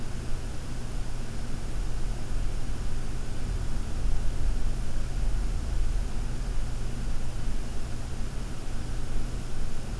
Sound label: 1000 RPM